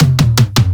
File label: tom filler; one shots; SEISMIC Drum Sample Pack; Slate